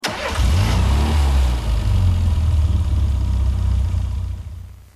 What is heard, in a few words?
engine start, vehicles cars; cooper65; tdmcars